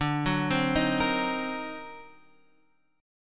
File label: D 7th Flat 9th Flat 13th D, chord; X 5 4 5 4 6; arpeggiated